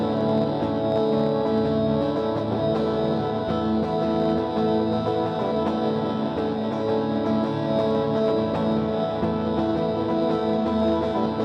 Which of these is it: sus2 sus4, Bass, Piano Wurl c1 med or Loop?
Loop